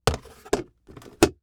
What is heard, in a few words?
Household Bin Close Plastic